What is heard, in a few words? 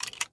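inspect movement; Valorant; cstrike; sherrif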